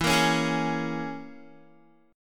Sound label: E 6th Flat 5th E down Guitar; E6b5 strummed